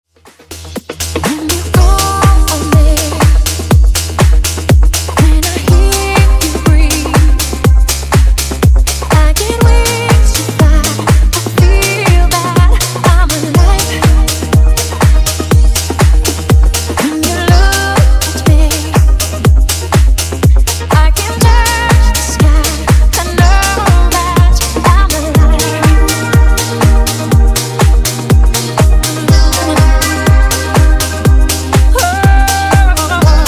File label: Funk Edit Clean 122Bpm